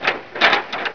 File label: door; PC Gamer IT CD 31; ARMRCMD